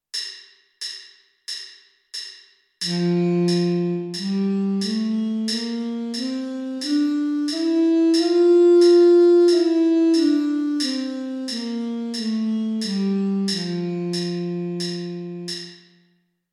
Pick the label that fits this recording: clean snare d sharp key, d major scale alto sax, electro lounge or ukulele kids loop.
d major scale alto sax